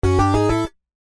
multimedia game sound synth tone bold advance

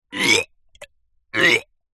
human male gag

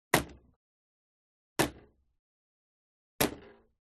Bow & Arrow Impact Sweetener Wood Medium; WEAPONS

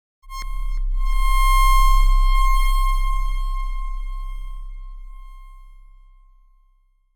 sound design effect shrill synth whine; sound effects one

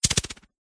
warfare knife throwing weapon spin fast air

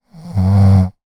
human female snore single; zapsplat

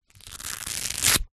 sport goalkeeper glove velcro strap pull

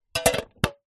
tin metal small lid put on

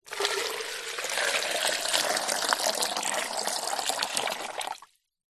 foley liquid water pour into plastic container

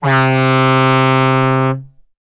transportation boat horn dry; sound effects one

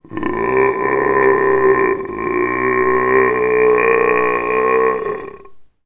horror monster zombie male groan

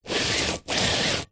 sport soccer ball flat scrape concrete; zapsplat

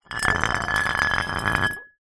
glass bottle drag pebblecrete ground; foley

